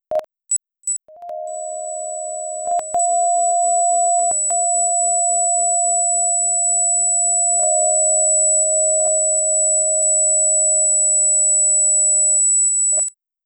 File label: Asystole